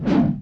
tower manager attack

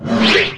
attack; OPHOIS

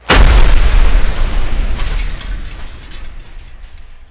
bomb die

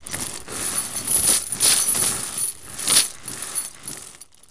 chain

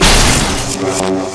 attack; morocc 4

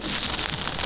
horn scaraba stand

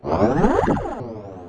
dark snake lord die

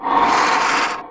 move; NPC SERPENT

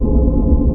angel iceslug move

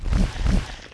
airboat boss move

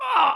armed villager damage

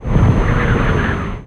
breeze attack

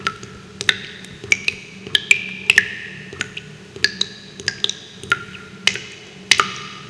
subterranean waterdrop

